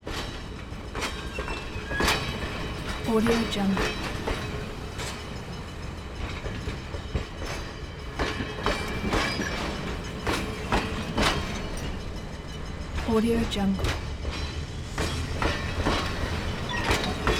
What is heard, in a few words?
train ride; collanderson